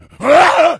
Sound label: become enraged, infected